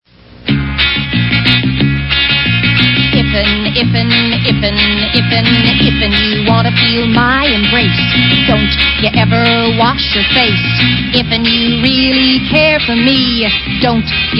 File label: song